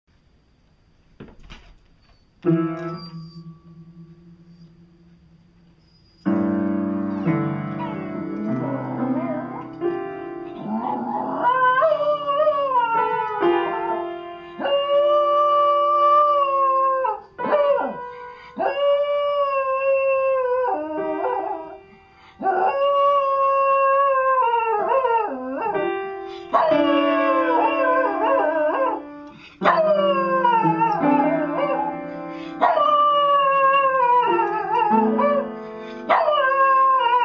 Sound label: Tucker piano